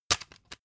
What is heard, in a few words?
cd in cover fast